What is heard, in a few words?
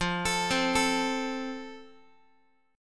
F Major, Guitar; arpeggiated; x,13,12,10,13,x